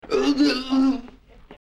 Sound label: uuhgliughh